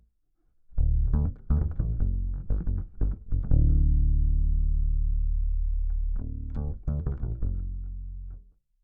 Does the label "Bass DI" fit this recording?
Yes